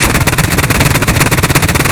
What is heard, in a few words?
ZU 23 2; Weapons; DCS